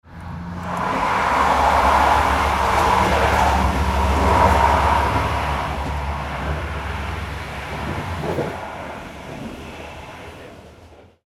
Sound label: train cabin inside railtrack noise